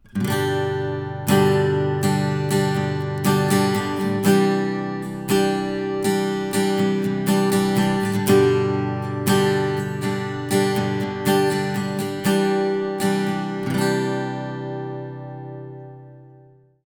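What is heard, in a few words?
at2020 pos1 shoulder stereo, acoustic guitar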